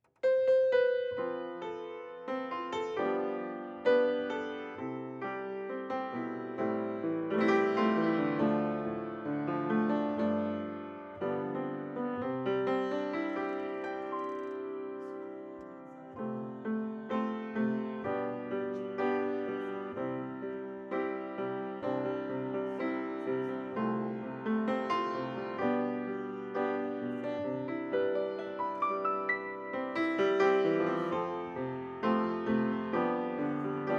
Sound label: piano high